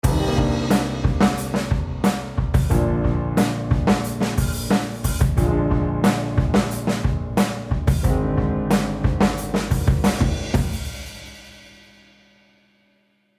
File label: unnamed piano with drum